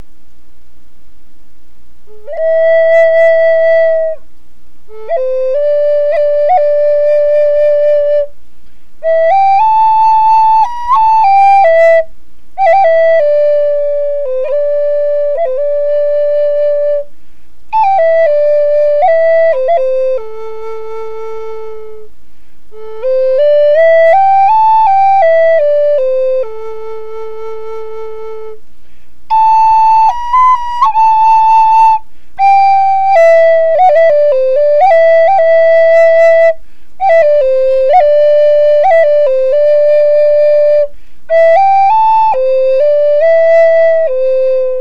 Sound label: A Plains 88 Bore, flutes